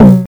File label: TOM, TRASH KIT; USB Soundscan vol.11; Drums Dance & Groove; AKAI